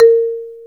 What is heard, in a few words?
MARIMBA G4; AKAI S-Series CD-ROM Sound Library VOL-2